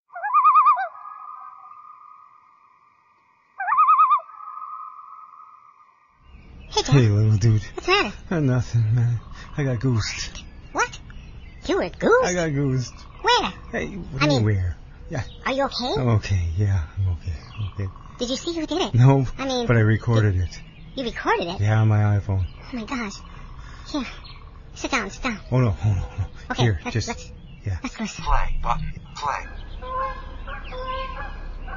I got goosed yes goosed and I liked it binaural stereo style